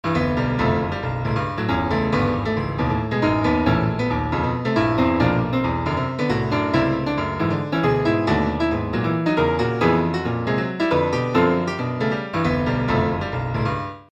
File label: piano